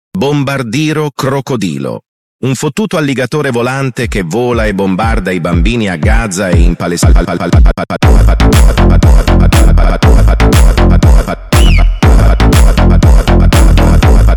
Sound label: brazilian phonk; bombardino sroccodrillo